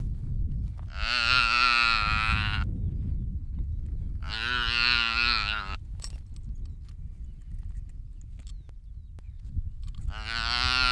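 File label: Saiga tatarica calf hand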